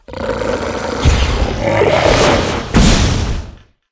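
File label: moth attack, monster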